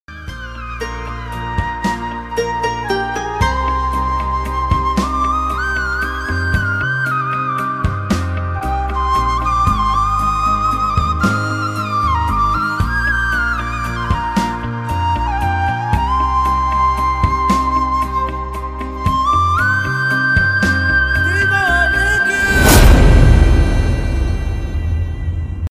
flute ringtone drama ringtone ost instrumental ringtone